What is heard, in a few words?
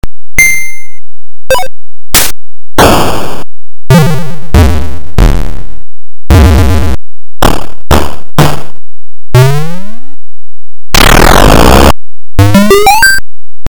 Power Up Sounds; Nth Pong Wars